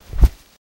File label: Bear Paw Movement